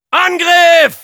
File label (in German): Soldier battlecry, de